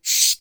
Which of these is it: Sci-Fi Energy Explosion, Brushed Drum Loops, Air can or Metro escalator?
Air can